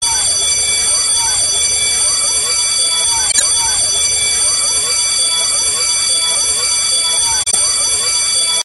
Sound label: Ring